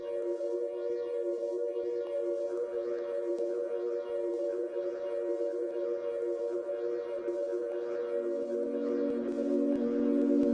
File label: Sine wave